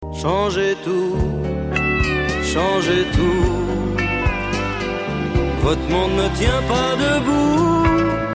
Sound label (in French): Triton Chromatique